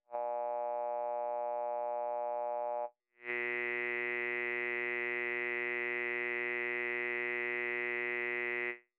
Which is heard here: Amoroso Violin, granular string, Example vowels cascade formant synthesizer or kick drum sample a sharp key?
Example vowels cascade formant synthesizer